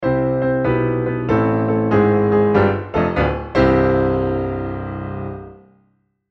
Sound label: riff